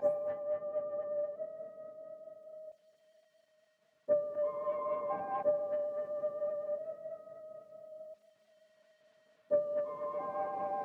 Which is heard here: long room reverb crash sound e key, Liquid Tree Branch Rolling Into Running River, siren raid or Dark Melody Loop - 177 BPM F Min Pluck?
Dark Melody Loop - 177 BPM F Min Pluck